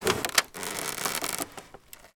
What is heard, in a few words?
polystyrene plastic stress movement; foley